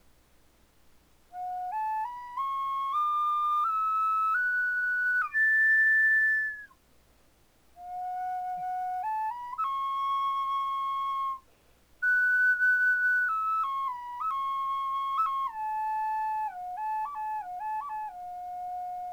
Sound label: high F sharp, flutes